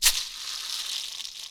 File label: box rattle; Kenya Box Rattle